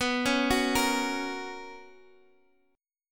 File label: B Major 7th Suspended 2nd B Guitar Open G